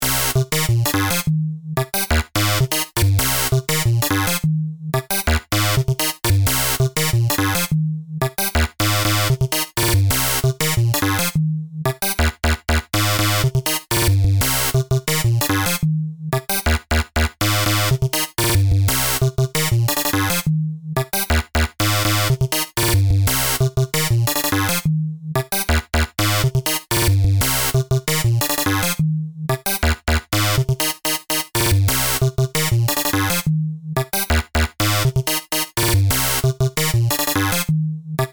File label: repeat, sequencer; the sequence continuously breaks away from the beat, and then lines back up with it in unpredictable ways; Numerology; Five12